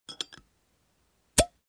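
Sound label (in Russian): routinely opened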